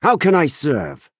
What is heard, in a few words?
imperial grunt